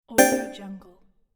notification; yevhen-lokhmatov

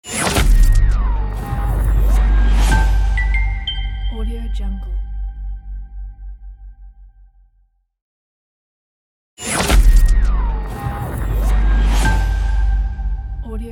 short glitch logo

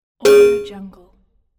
metallic hit

